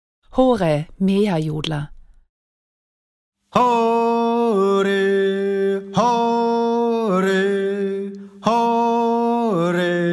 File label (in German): Mäher Jodler